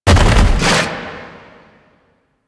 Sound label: Containment Protocol Wall Build; Warframe; Warframes; Qorvex